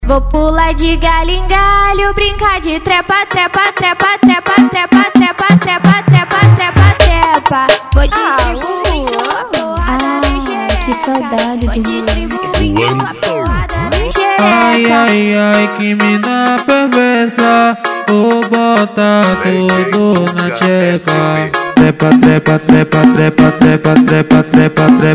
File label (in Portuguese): som automotivo; paratinga bahia